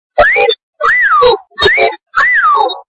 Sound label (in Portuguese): Fiu Fiu, Vinheta